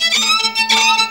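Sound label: VIOLIN GROOVE; Best Service; World Colours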